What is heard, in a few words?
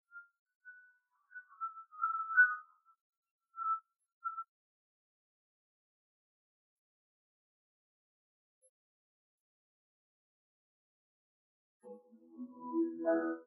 piano; spleeter